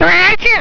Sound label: Old Thrasher, thrasher; The Ambrosia Times